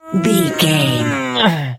voice male b effort push fast

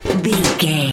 metal tin impacts movement rattle

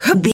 voice female effort jump action

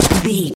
footstep gravel land

